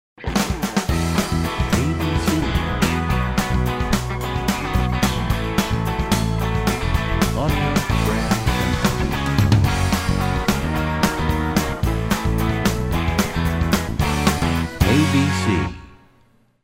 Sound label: American Country, radio jingles